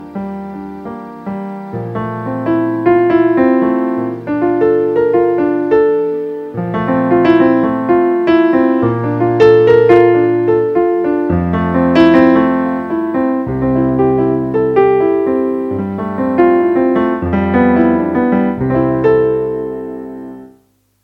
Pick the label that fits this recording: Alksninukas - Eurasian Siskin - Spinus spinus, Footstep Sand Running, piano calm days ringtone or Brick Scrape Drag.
piano calm days ringtone